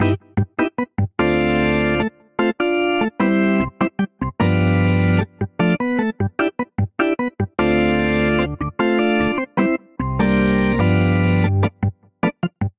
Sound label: Vamp Souljazz Organ G6 - D Min7 - C7 9 - G7 75 bpm; Electric Keyboards; Ableton; Factory Packs